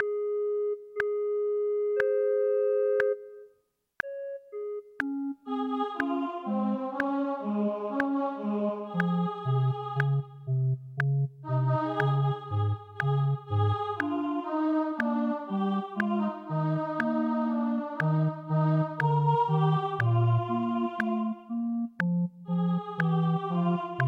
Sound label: O clap your hands; Gibbons